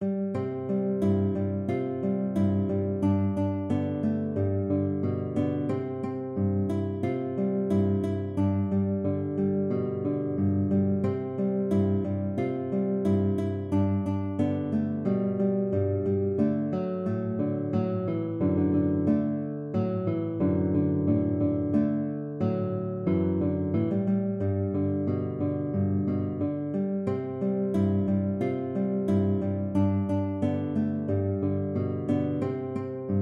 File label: guitar